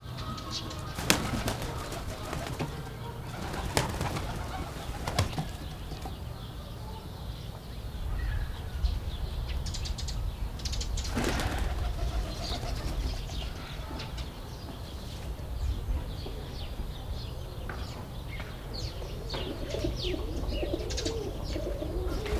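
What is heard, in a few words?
Debrecen tumbler pigeon, flutterings; debreceni pergo galamb; debreceni zoo 2012; marantz puska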